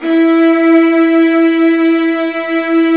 String Sections Violas, Bright; Roland LCDP13

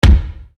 Bass Dr, EuroDance Bdrums

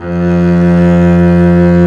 STR, Cb Bowed, f vb; Roland L-CD702; Cb2 f vb

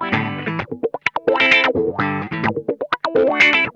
ROCKIN, Funk Guitar; 90 s Sample CDs; Zero G

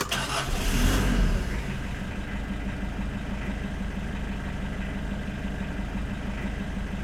ENGN STRT; AKAI S-Series CD-ROM Sound Library VOL-6

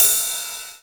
909 RIDE; E-MU Formula 4000 Series Vol. 2

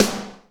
SNR, Roland; Northstar - Drumscapes Roland

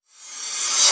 Reverse Cymbals

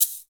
shakers

